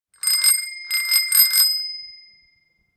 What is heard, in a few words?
Bicycle bell rings multiple times